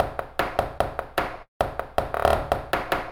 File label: Knock Beat